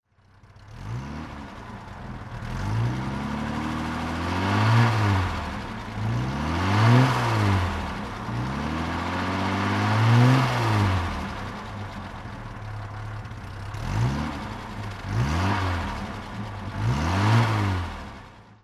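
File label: jag 420 throttle, Engine sound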